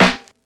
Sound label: Snare One Shot F# Key, acoustic snare tuned to the F# note. Loudest frequency: 1609Hz